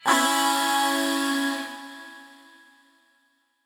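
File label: Dmaj Backing Vocals Air Vox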